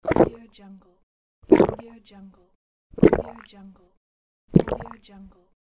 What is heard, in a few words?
gulp